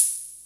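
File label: open hi hat, dance kit; Best Service Advanced Media Trax Modern Composer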